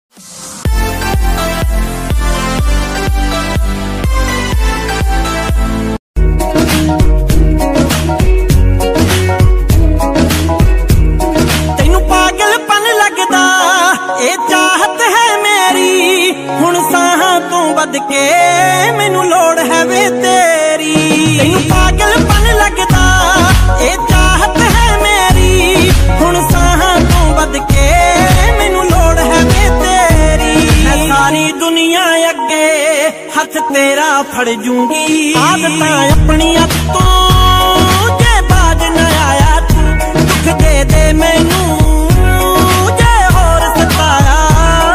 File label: punjabi love ringtone, punjabi sad song ringtone